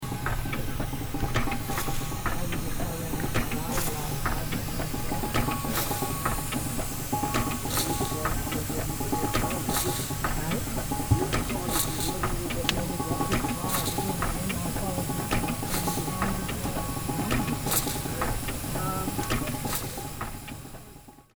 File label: tractor steam idle